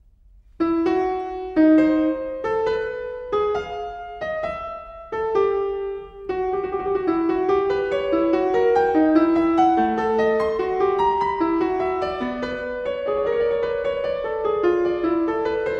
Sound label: Partita No.6 e-moll; Bach; BWV 830